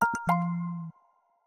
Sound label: Morning Dew, notifications; Samsung Galaxy S26 Ultra